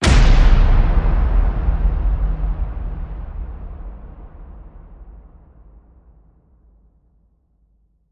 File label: Hit Big Cannonry